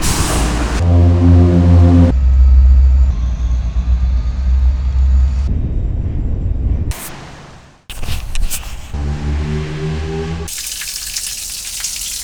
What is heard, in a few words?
Industrial Sampler